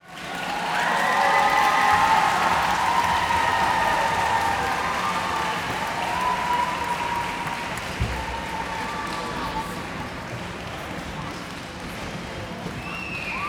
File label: cheer